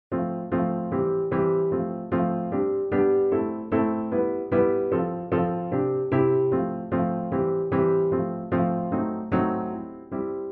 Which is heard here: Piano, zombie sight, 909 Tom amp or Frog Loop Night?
Piano